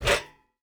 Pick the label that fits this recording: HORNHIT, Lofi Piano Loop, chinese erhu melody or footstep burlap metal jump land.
footstep burlap metal jump land